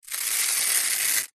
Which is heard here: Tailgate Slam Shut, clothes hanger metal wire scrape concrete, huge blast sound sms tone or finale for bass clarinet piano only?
clothes hanger metal wire scrape concrete